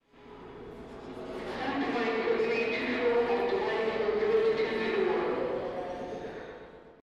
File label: radio